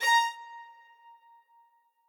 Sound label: string